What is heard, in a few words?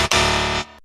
wrong answer gameshow